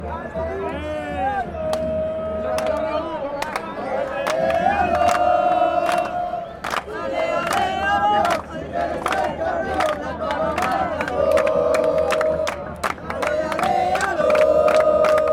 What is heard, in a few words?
ale ale alo, chants